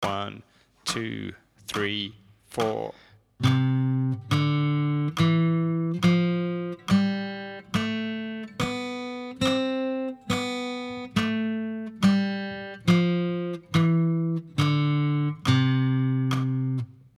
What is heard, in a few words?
scale c major, guitar